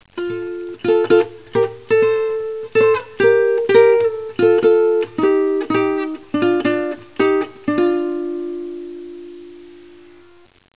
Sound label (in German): Gitarre